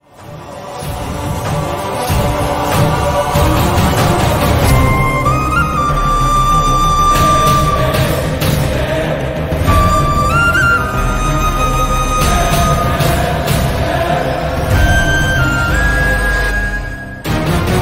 Instrumental BGM Ringtone; Vikrant Rona; Kannada

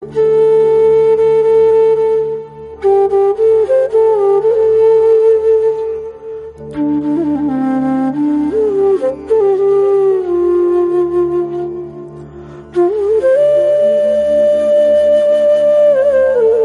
Flute ringtone; Telugu

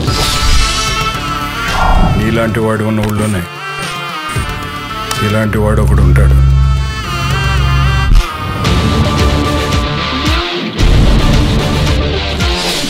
Teaser Ringtone, Telugu; SPYDER

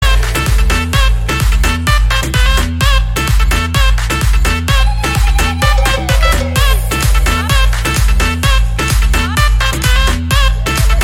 BGM; Annabelle Sethupathi; Ghost Party; Tamil

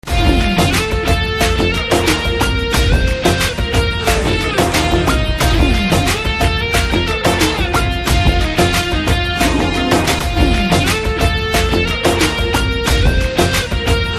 Love BGM Ringtone; Dabangg 3

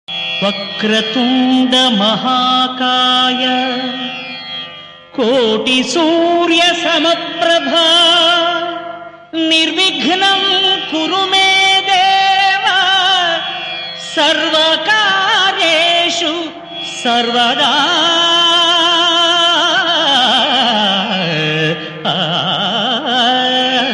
God Song telugu Ringtone; Devotional

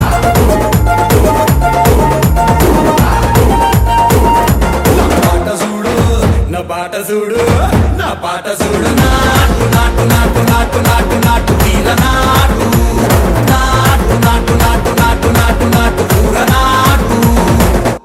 BGM Ringtone; RRR; Telugu

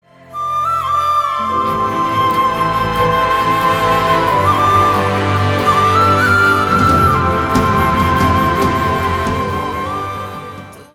Instrumental Ringtone

